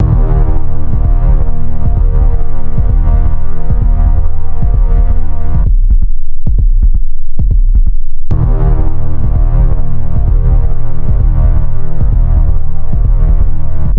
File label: Fast Heart Beat